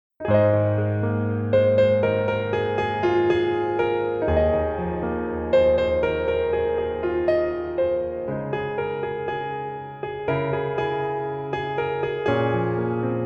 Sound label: piano arrange ver